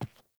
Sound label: Boot Walk Wood, Footsteps; Characters; Enemy; Melee Grunt; Boots; Sinecure